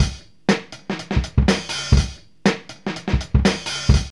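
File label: 124 bpm, Funky loops; Zero-G; Funky Elements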